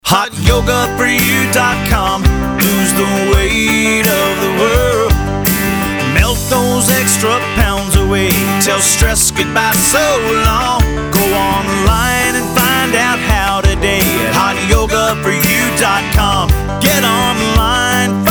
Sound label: Hot Yoga Country full sing w banjo